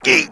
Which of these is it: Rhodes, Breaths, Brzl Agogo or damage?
damage